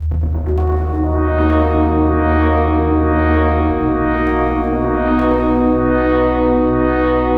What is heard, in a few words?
Clicky Hornsemble; fabrik pro